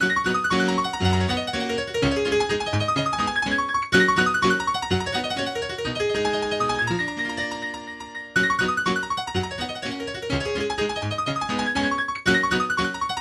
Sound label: Etude in G Flat Major Vivace; Etude No 5; Black Key; Opus 10 No5